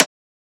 Transient; FLPRO

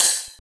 Ride; FLPRO

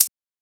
Shaker

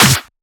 SnareClap; FLPRO

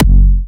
Harder F#4, Big Room Kicks

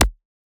Percussion; FLPRO

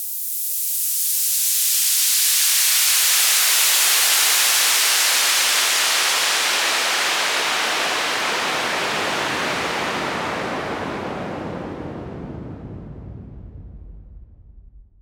Fall Clean, Falls

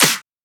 Shifted Clap, Claps; FLPRO

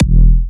Hitter E4, Kicks, Big Room Kicks

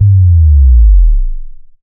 SubDrop Basic Simple

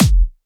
Kick; FLPRO

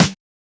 Snare; FLPRO; Snares

